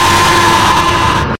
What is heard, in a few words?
jumpscare sound; night shift at sparkys rebuilt